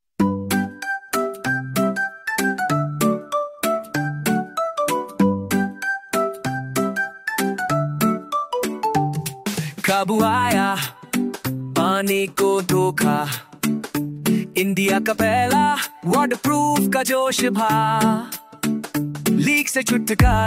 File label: Happy Jingle, KABU Happy Radio Jingle